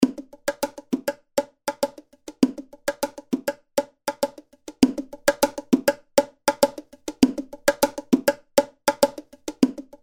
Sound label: Conga; Fatso